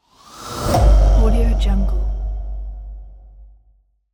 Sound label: whoosh boom